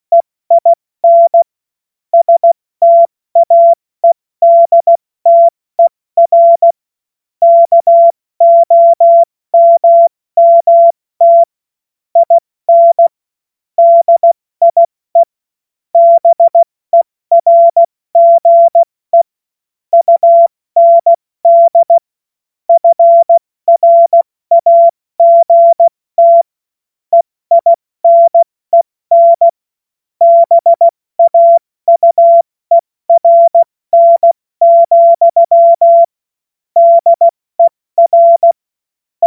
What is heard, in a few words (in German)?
Witz, Morsekurs, cw morsen